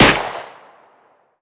32ACP, Guns Fire; Weapons

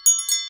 bells, Footsteps

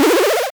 player charge, arcade

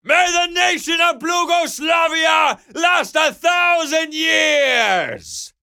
charge

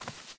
carpet barefoot, Footsteps

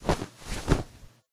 rustle

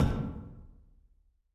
hull, Footsteps

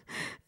woman gasp

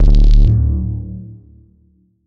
rad pulse

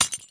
glass crack

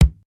thick stamp, stamp

